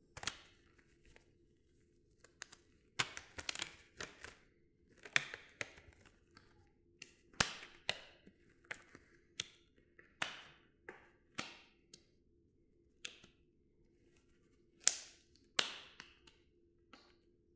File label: Vinyl Gloves Getting Put On